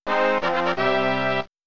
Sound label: Level Up SFX